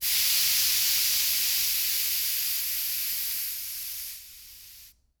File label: Industry Tool Air Escaping From Air Compressor